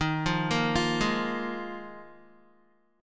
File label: D sharp Suspended 2nd Suspended 4th D sharp, Guitar; arpeggiated; chord; 10,8,10,10,8,x; JGuitar